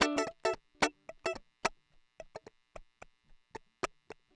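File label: 110 Latin Jazz Guitar E major; Digidesign; Pro Tools Essential Loops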